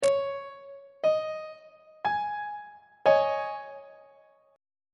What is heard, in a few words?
g sharp suspended 4th 1st inversion on treble clef